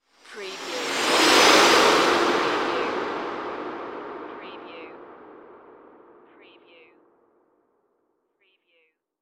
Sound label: Whoosh Heavy